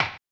HAMBONE, Vocal Blues Soul; Spectrasonics; Planet

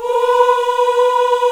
AFRO AH B 4, CHOIR AHS; Spectrasonics; Heart of Africa

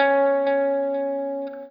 NOODLE LONG, Guitar & Bass

